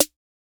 Snare, Ooooh; drumkits; Snares; fl studio; young kico drumkit

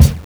kick; goodtakimu drumkit; fl studio; drumkits

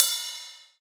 Ride, Cymbals; drumkits; fl studio; bvker drumkit

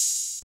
regular openhat; drumkits; openhats; fl studio

